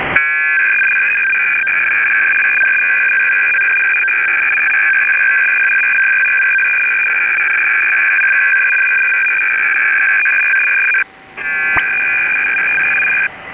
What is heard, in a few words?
Clansman 300Bd, British military CLANSMAN FSK system; CLANSMAN radio running 300 Bd; CLANSMAN FSK BURST SYSTEM; FSK-systems